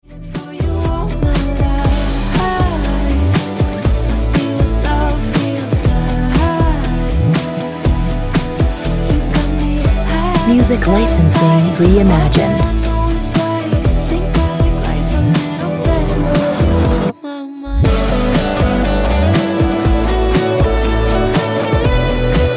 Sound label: Ringtone